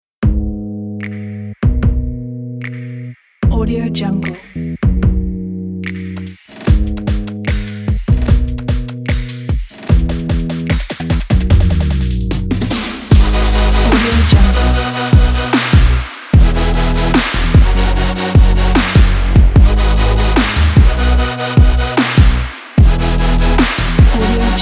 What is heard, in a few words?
on dubstep